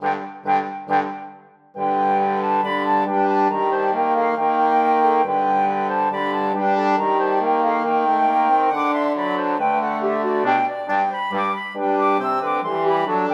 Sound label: Bush Dance; MTWFC VOL1 Audio Accompaniments